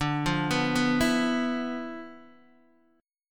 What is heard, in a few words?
D-Minor 6th Add 9th, D, Guitar, Open D